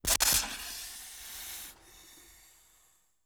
Snake Strike

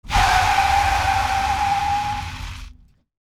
Sudden Stop

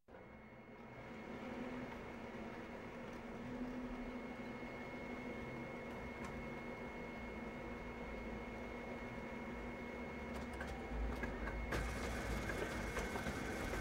Copying Machine

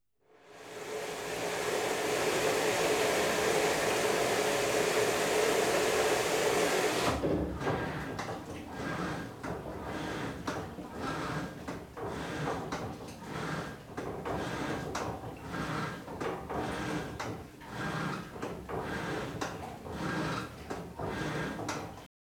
Washing Machine

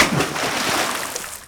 Splash Hard

